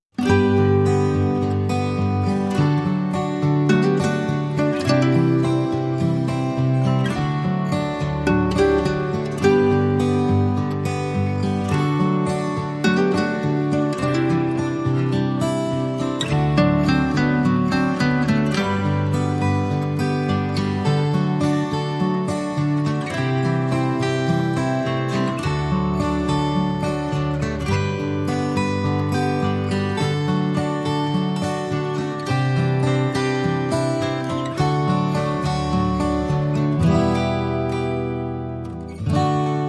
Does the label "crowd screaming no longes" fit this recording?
No